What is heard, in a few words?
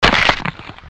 CRUSH